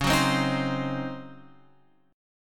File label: Db Major 7th Suspended 2nd Suspended 4th Db Guitar Open F; strummed